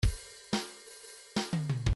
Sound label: swing 1 bar